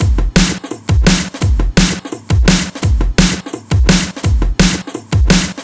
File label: Drill Beat B